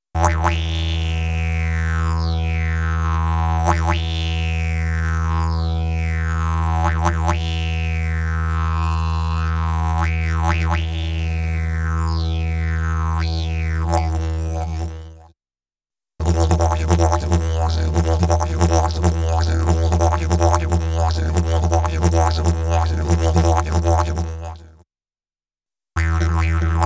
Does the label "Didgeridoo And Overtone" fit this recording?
Yes